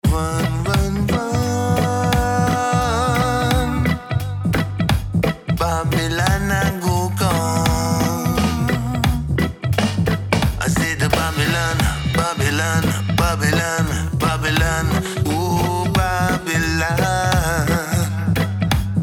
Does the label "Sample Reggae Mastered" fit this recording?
Yes